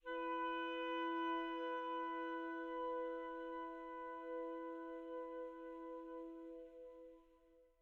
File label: Env Sky Low Altitude Melody Day; the legend of zelda tears of the kingdom